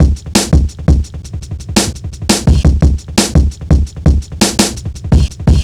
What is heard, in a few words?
170, drum loops, drum & bass